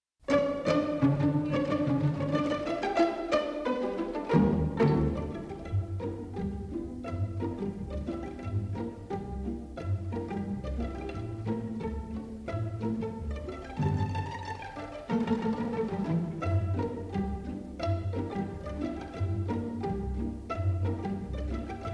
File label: jazz pizzicato